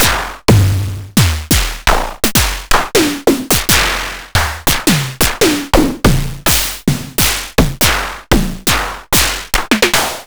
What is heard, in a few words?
ms20 raw snares